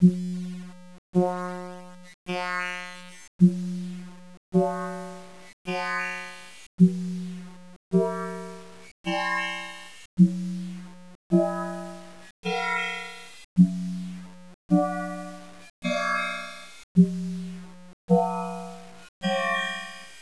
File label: tuning; Sonification